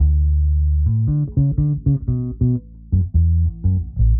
bass

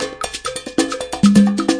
straight up latin, 133 bpm, drum loops and breaks

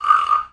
guiro; Clangy Crunchy Foundsound Kit

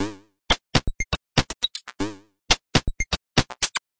uncertain wobble analog drum, 120 bpm

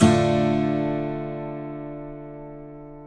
acoustic guitar chords; multisampled

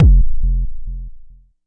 Jomox MBase, drum machine; Jomox MBase 01

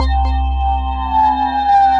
Synthesizer Middle C Notes, Lead

